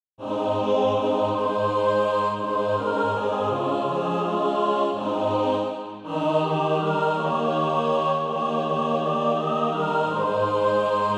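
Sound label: mixed, Practice Music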